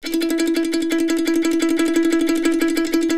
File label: CEE TRILL E R, Blues Guitar